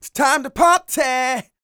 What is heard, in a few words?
SING, Vocal Planet R&B & Dance; ILIO